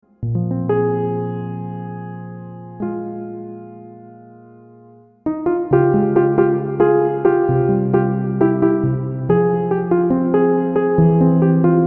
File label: Sampler